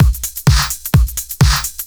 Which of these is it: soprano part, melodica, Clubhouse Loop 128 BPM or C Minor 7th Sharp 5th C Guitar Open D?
Clubhouse Loop 128 BPM